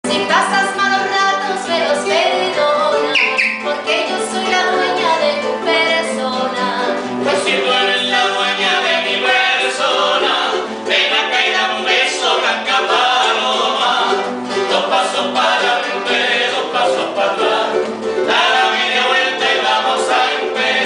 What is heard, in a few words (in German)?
kanarische musik